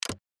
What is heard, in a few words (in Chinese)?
iOS13 锁屏音